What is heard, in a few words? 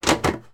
insect stick insect plastic tank lid put on; sound effects one